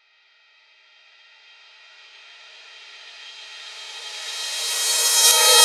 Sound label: a reverse cymbal riser; peak